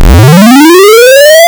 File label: Perfect SQ Steps, Digital; Xfer Records Serum; Serum Presets